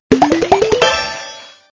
Box blow

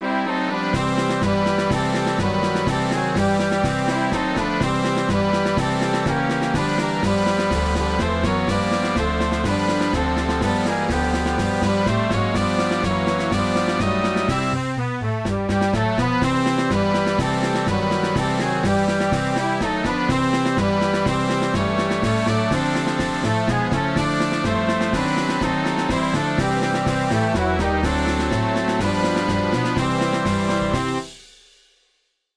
Swing; Watch Me Jump; Go, Bulldogs!

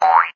Die arrow

